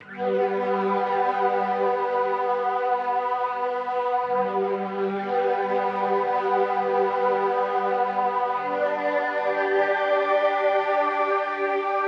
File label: mellotron